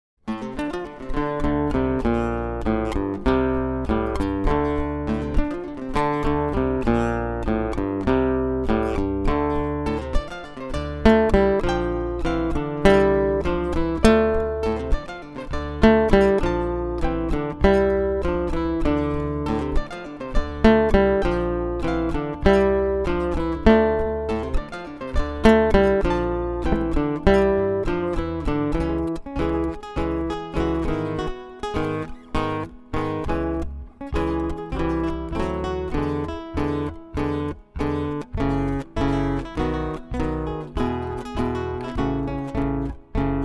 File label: guitar